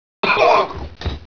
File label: bandit die headshot; gearbox